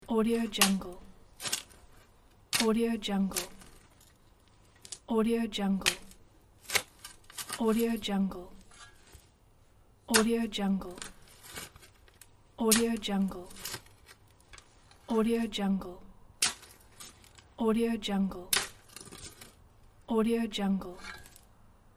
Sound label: shoveling sand